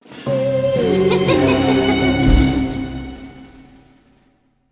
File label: UI Victory Music Lost; gamerip; Hero Defense Haunted Island